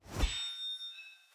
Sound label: Sword Swing; soundscrate